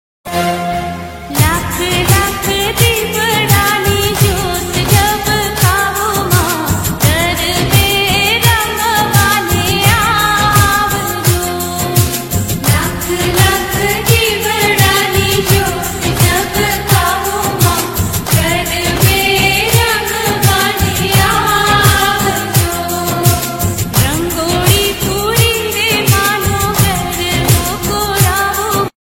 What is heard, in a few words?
gujarati songs for ringtone